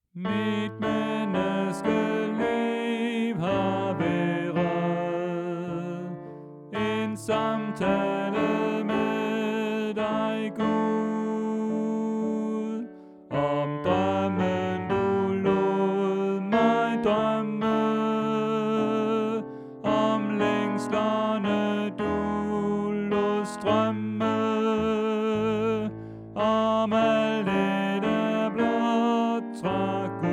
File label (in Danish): Tenor; oevefiler